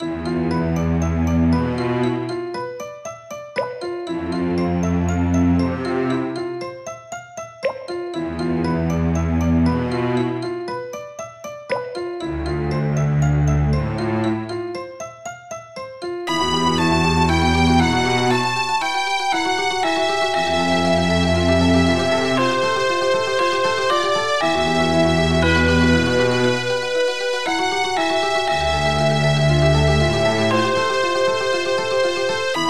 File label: cave transition music